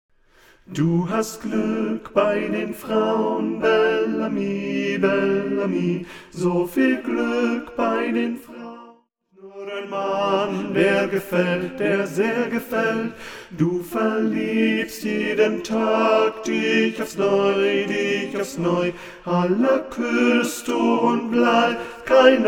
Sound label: BASS